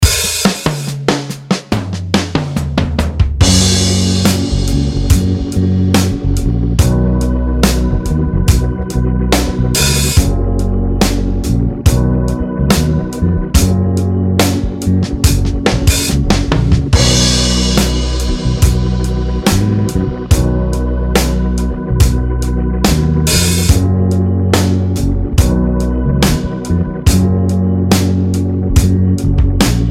slide solo backing

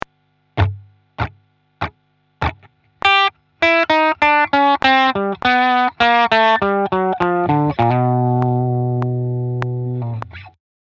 blues, guitar

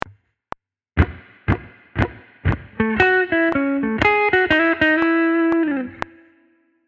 blues track

